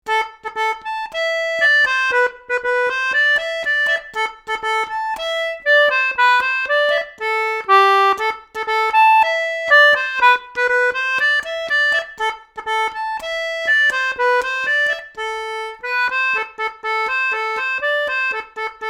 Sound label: french grass, concertina